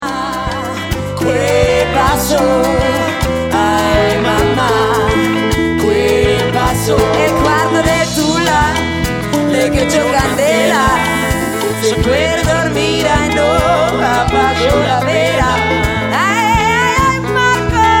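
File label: Sextet (vocals, trumpet, guitar, guitar, bass, drums)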